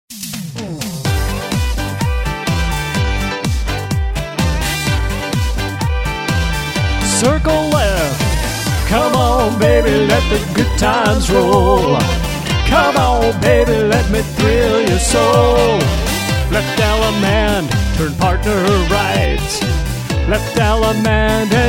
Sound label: vocal